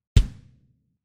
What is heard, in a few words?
kick